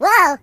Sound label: Jump; hedgewars